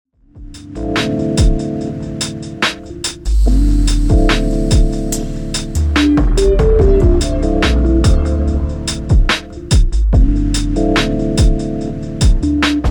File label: Delay Amb